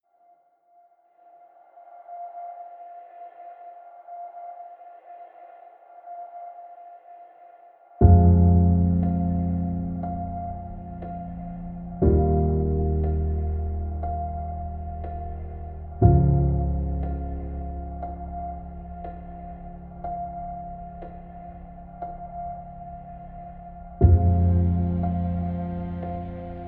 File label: Instrumental